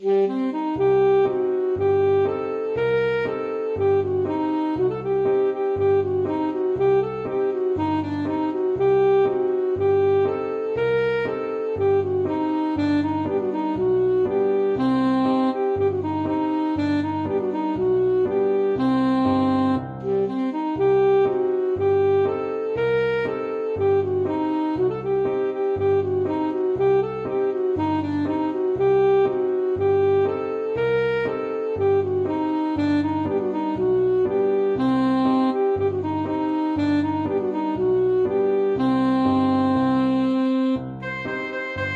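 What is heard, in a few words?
alto saxophone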